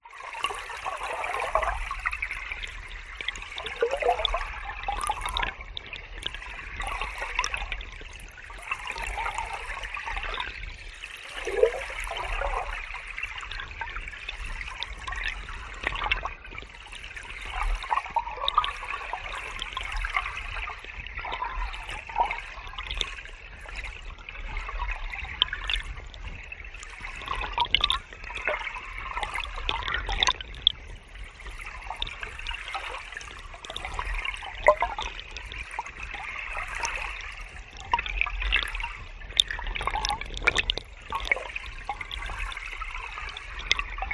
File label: hydrophone stream forest streamlet medium gurgling